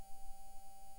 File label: mic, mars2020 edlcam